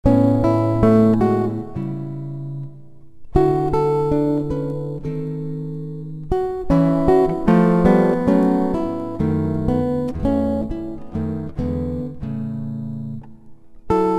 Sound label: Back Porch Rocker